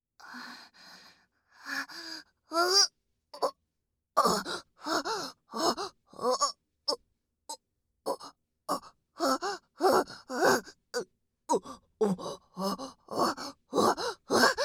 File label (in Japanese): オホ声; SEなし